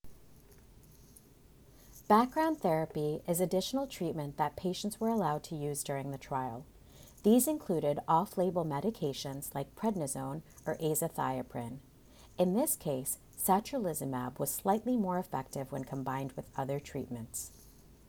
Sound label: Background Therapy; Enspryng